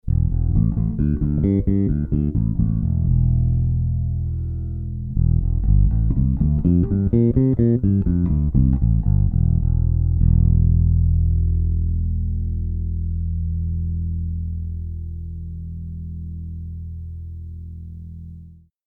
BELDEN 8412 Bass

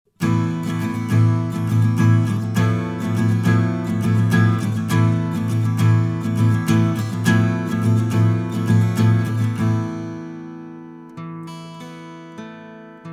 AT4040

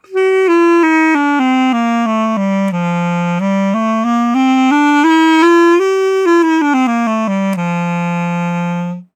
Clarineo

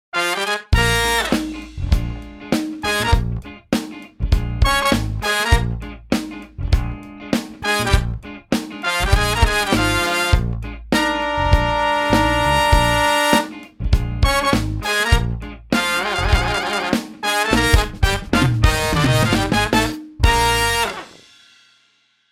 Slow Trumpet Trombone; The Trumpet 3; Sample Modeling

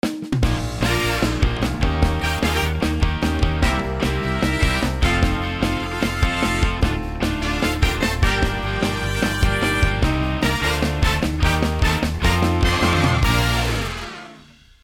fast CHH; MOJO

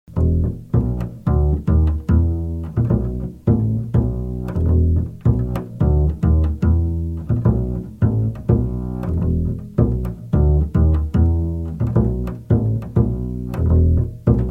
AT4040 BASS

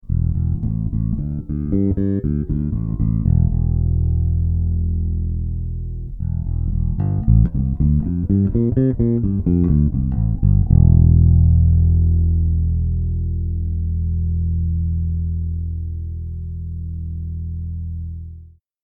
OYAIDE ACROSS750 Bass

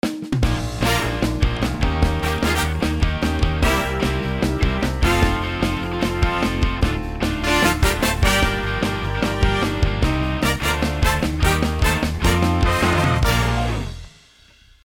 Fast Session Horns; Session Horns Pro; Native Instruments

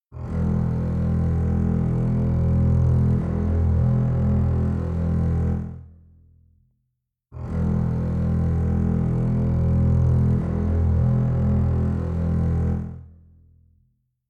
pan, 2

